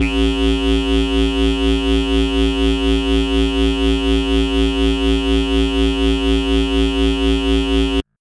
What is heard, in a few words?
laser; DanmakuProject